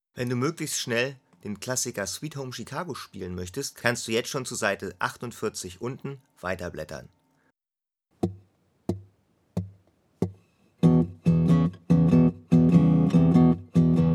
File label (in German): Der Boogie Riff in E Variation, bluesgitarre; Akustik Bluesgitarre